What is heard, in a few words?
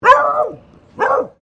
Animal Dog Beagle Female Bark Close